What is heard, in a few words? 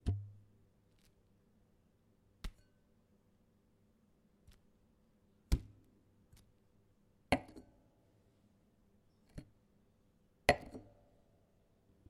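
placing a glass cup on a wooden table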